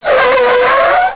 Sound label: car squealy